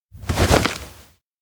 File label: duckboat land; chiharu; anemoi